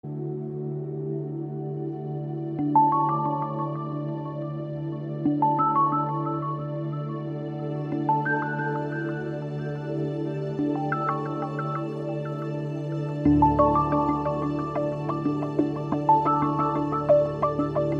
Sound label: Bubbles